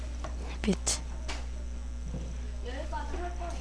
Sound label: beat